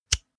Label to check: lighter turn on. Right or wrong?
Right